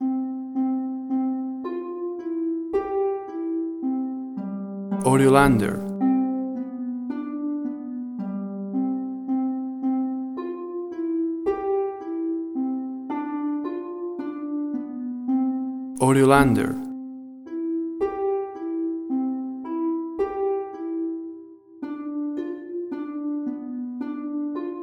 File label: Bobbie Shafto Harp